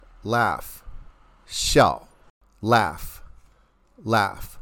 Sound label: laugh